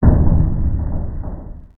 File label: Percu; Revista CD Expert 50